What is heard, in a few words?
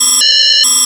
DETECT, SIGNALS; AKAI Anime Sound FX